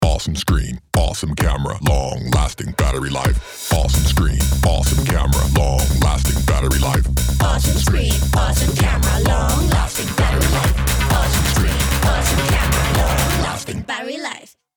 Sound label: DANCE NO VO FULL MIX; SAMSUNG